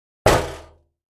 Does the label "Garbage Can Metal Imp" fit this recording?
Yes